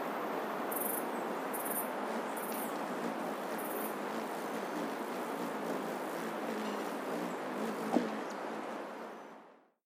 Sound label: Spangled coquette